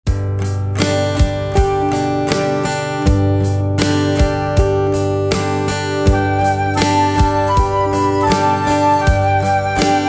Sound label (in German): Wellen mit Floete hoch; floetenschule